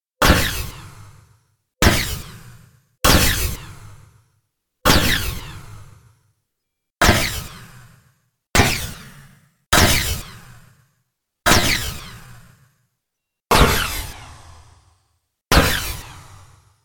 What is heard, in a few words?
Laser Cannon